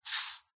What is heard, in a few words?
technology mixing desk fader slide short fast; zapsplat